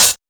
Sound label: D&B Hat; neuro